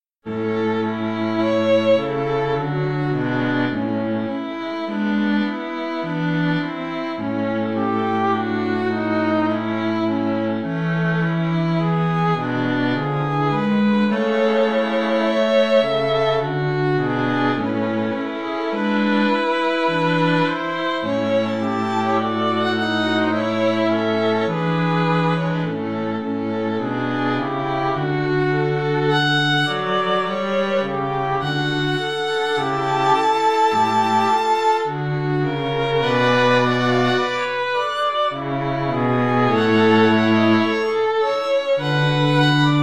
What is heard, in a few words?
string quartet